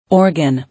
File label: Organ